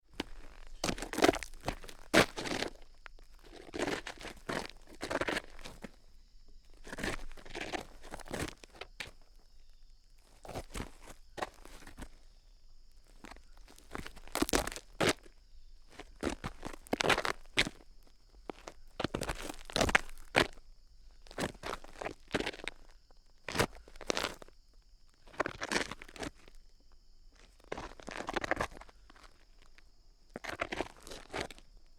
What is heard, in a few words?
scuff gravel with soles